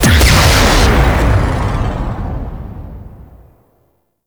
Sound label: Race Mode Ship Explosion; Warframe; Ambience; Space Battles